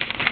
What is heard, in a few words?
Turn Page; Zymurgy - Brewing Beer